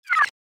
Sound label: Bar chart fade out animation infographics